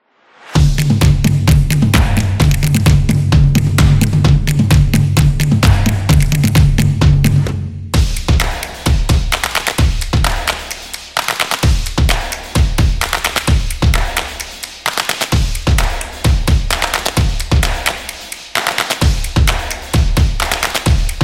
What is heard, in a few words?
Kinetic, percussive